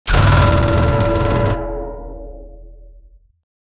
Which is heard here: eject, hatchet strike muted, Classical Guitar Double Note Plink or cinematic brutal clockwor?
cinematic brutal clockwor